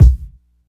reverb steel kick drum c key

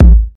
sharp kick single shot a key; kick drum samples

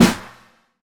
perfect snare drum a sharp key

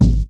boomy kick drum single shot A key

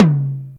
verby tom sample c sharp key

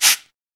short reverb shaker sound sample G key; tuned percussion

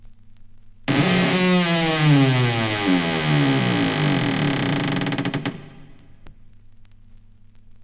creaky door closes

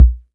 dry kick drum single hit b key; kick drum samples

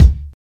rich lows kickdrum sample c sharp key

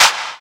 clean clap one shot a sharp key; single hits one shots

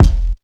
short kick drum single shot f key; kick drum samples

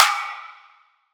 Treble Heavy Trap Acoustic Snare D Key

